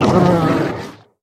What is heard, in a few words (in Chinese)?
北极熊死亡声